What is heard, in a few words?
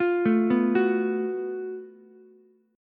Gb Minor Major 7th Double Flat 5th, Ukulele, chord; arpeggiated; 10,9,7,9; JGuitar